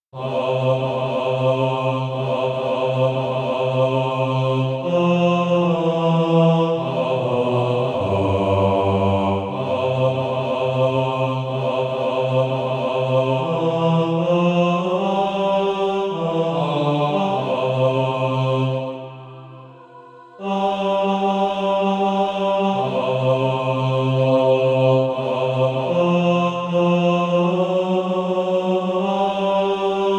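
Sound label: bass, Practise Music; SATB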